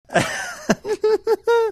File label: cute laugh